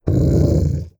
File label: Howler What